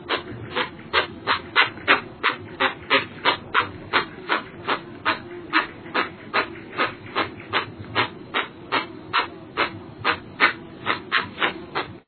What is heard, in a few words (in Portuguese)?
jacupemba